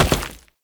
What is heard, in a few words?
Step Rock, Foley; Warframe; Oraxia